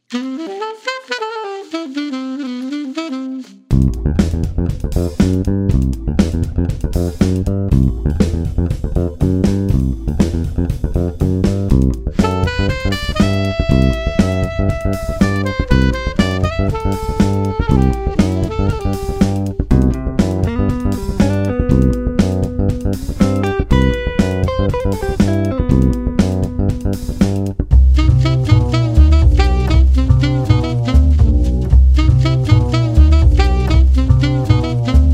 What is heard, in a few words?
Jazz